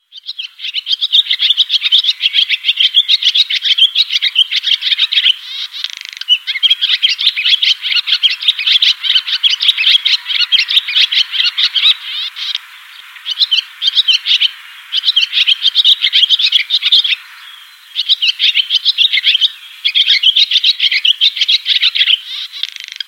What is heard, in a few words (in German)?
rauchschwalbe